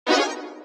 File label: win low; aviamaster; Aviamasters